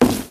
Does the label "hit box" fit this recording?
Yes